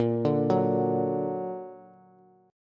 Bb Augmented Bb Bass; arpeggiated; JGuitar

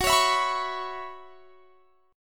Gb 7th Suspended 4th Gb down, chord; Gb7sus4 strummed

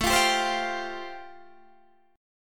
A Minor 7th Sharp 5th A, Guitar, DADGAD; Am7#5; strummed; chordshape

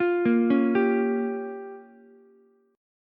G Minor 7th Flat 5th, Ukulele; Gm7b5 arpeggiated; JGuitar

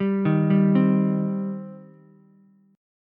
G Suspended 4th Sharp 5th, Ukulele; arpeggiated; 0,3,3,3; JGuitar

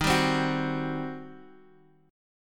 G Major 7th Suspended 4th Sharp 5th, D sharp

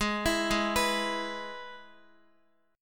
Ab Minor Sharp 5th chord, Guitar; arpeggiated; x,x,8,9,8,7; 4F,4A,5C,5G,5C,6E

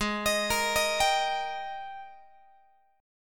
Ab Major 7th Suspended 2nd Ab down Guitar; AbM7sus2 arpeggiated; x,16,18,20,19,18

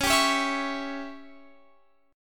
Db Diminished Db down Guitar Open F; strummed; JGuitar

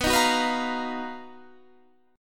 B Major Sharp 11th, Guitar, Standard; BM#11; strummed; JGuitar

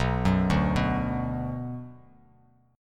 B Minor B Bass Standard; arpeggiated; JGuitar

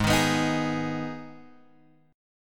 Ab Minor Ab down Guitar Open F; Abm strummed; JGuitar

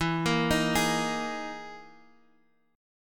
E Major 7th Flat 5th E, Guitar, Half Step Down

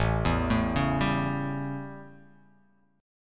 A 7th, chord; arpeggiated; JGuitar

